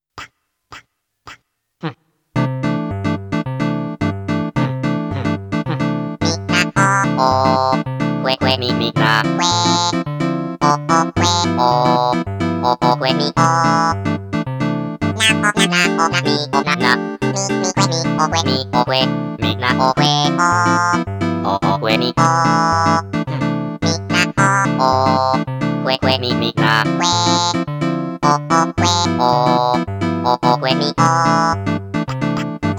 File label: Samba; animal forest totakeke music 2006